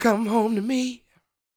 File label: SING W, Vocal Planet Blues & Soul, BLUESY; ILIO